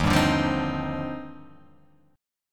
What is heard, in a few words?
Eb Major 13th, EbM13 chord; strummed; JGuitar